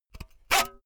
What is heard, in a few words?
saw use short unsteady